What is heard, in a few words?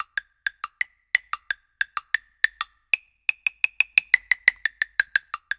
PERCS 90BPM; INIS - Opium